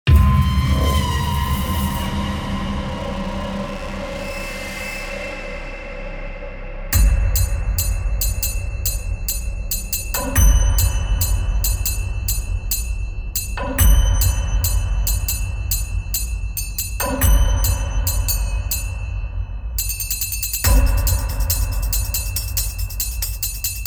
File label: Tribal Percussion